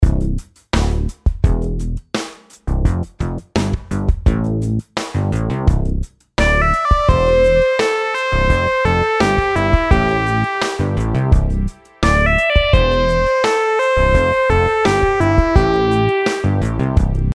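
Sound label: melodies simple 8s triplets C major; melody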